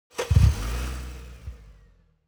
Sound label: Car Engine Start